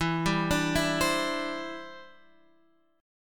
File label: E Minor 7th Sharp 5th